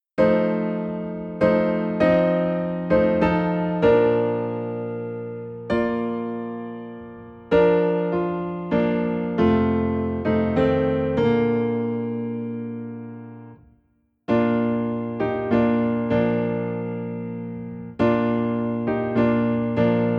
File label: Piano in B Flat